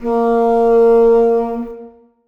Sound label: contrabassoon a#3; Sonatina Symphonic Orchestra